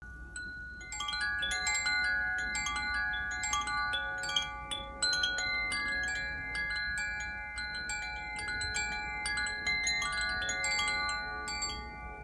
Blue Wind Chime; wind chimes blue for winter

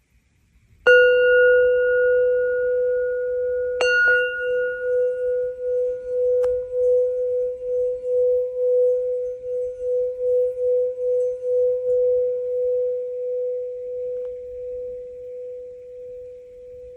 Tall Dimple Bowl, cast tall bowls

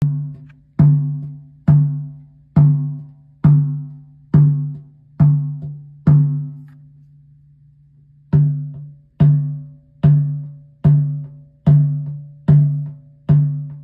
y-shaped mallet, shaman drum

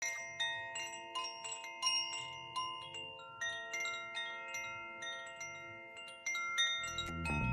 Summer Wind Chimes; atma buti wind chimes summer

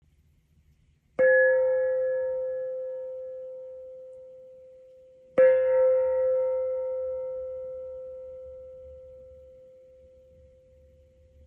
Om Prana, gong; serenity prana gong; 15cm

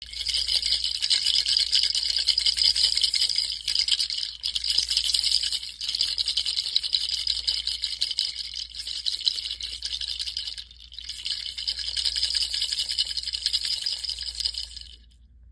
Shaman Rattle and Shaker

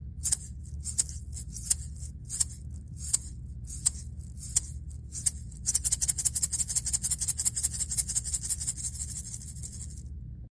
Wooden Egg Shaker

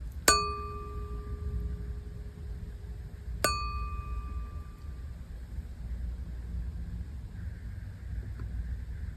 Double Tuning Fork, double silver 40 hz; tuning forks double silver 40 hz

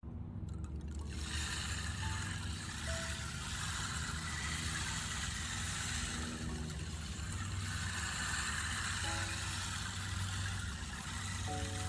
Rain Sticks, rain stick oval

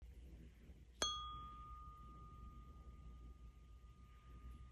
Gold Tuning Fork; tuning forks single gold

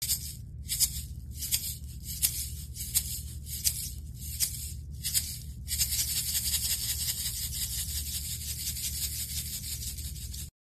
Shaman Shaker Black

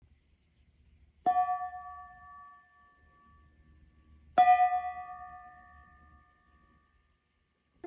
Gong, earth gong; serenity; 15cm; Atma Buti

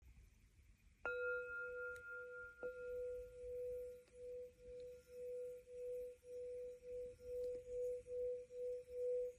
Gold Dimple Large, dimple bowl; 4.75 inch gold dimple bowl

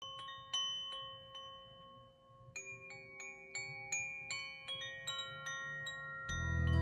Spring Wind Chime; atma buti wind chimes

